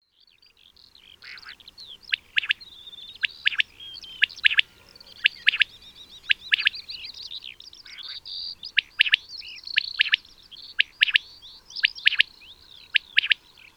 caille des blés

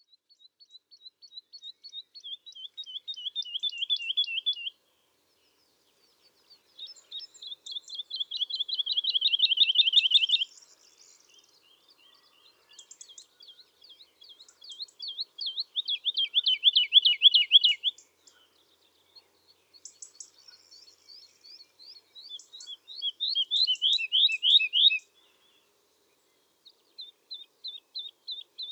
alouette lulu

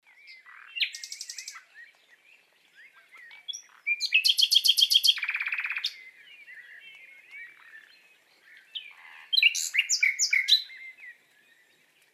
rossignol philomele

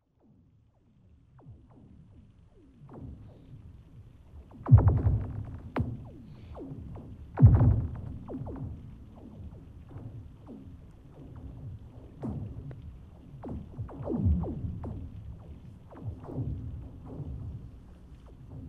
le chant de la glace

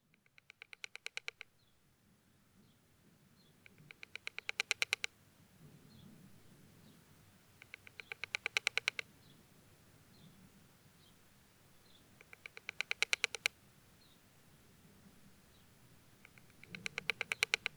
grosse vrillette

